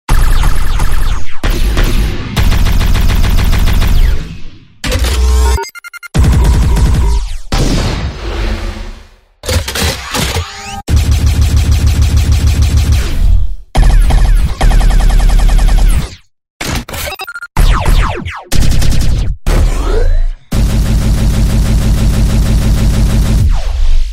Future weapons

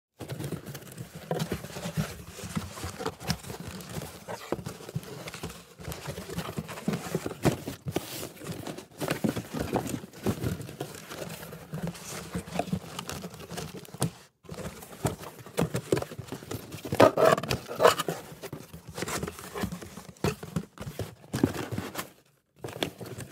Cardboard box

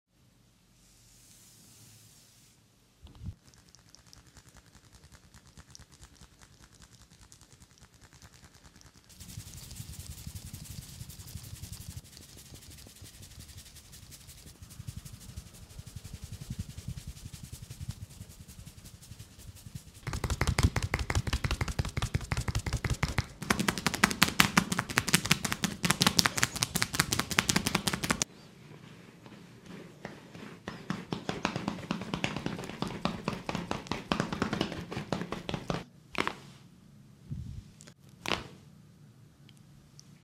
Massage

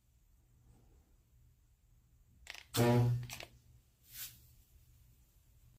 turn on tv

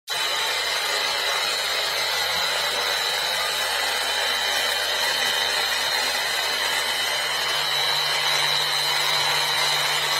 Polishing machine

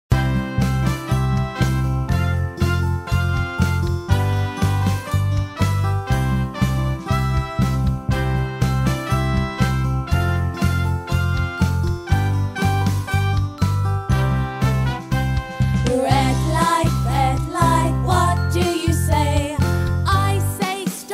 traffic light

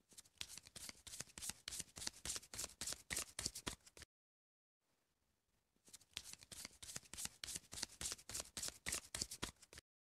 Playing Card

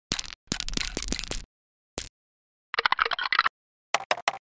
Lego pieces

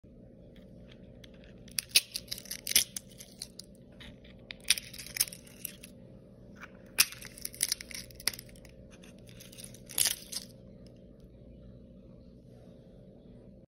egg shell

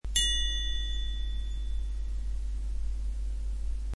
Zing

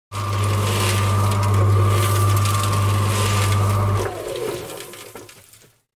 Cement mixer

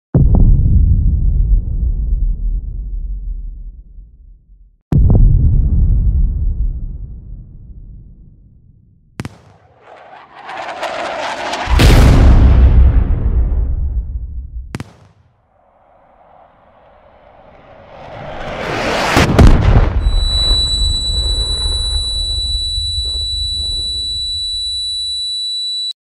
artillery

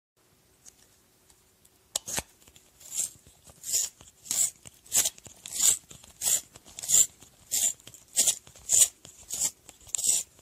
Pencil Sharpener

